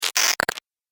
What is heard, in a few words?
Static Electricity Charge; Miscellaneous Electronic